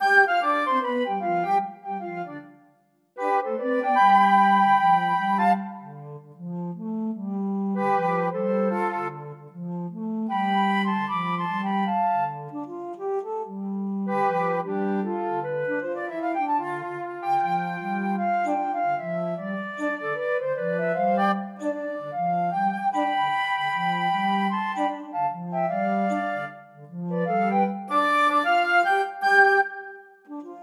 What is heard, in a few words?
Flutes On Road